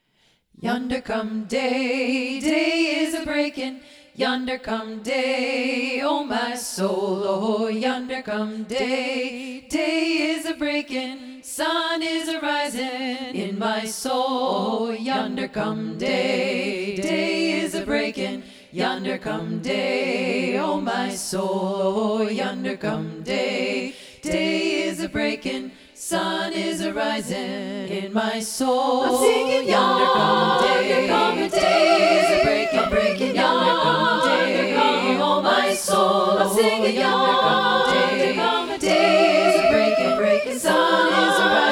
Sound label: Yonder Come Day SATB combined